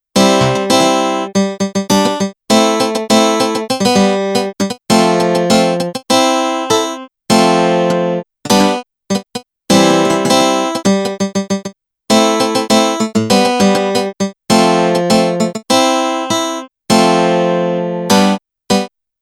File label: composition guitar